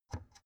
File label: oven knob turn soft